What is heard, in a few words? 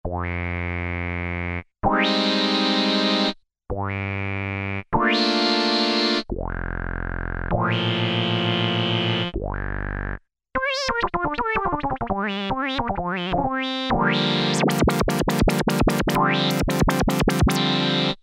Wet Ones, Korg Poly-800